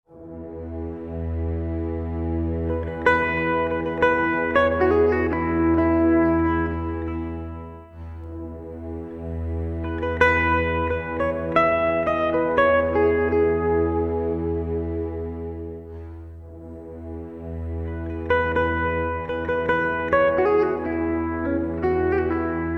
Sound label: Guitar Sitar Et Orchestre; YANNICK ROBERT'S SIGNATURE MODEL